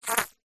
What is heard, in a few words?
animals insect bee wing flap short pre flight; zapsplat